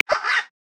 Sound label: Yellow-necked Spurfowl; Xiaomi Redmi-Note-8-Pro; Africa